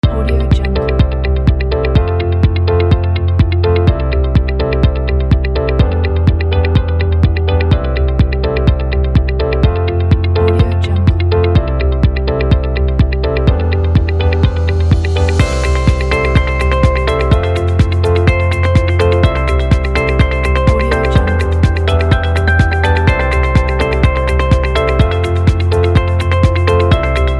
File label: corporate